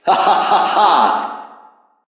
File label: cryo keen hahaha; sven; dialogs; e5m1